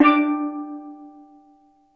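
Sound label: steel drum D3, tonal percs; Crypton - DrumBase5000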